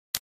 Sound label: Button Spring Click